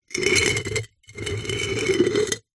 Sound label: household pot ceramic base lid scrape